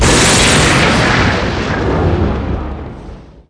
Rompebarrera; VTH

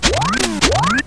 Garfio; RPG Maker; Legend of Heroen v1.20

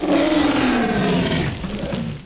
RUGIDO; RPG Maker; Legend of Heroen v1.20

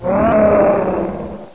RUGIDO; RPG Maker; Legend of Heroen v1.20

